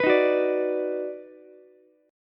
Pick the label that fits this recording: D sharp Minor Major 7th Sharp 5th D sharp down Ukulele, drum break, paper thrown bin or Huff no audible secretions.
D sharp Minor Major 7th Sharp 5th D sharp down Ukulele